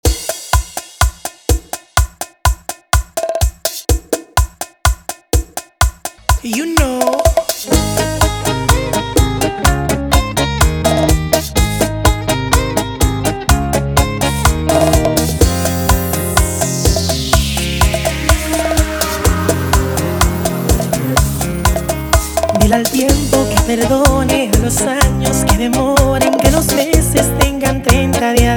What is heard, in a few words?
125bpm, Intro Outro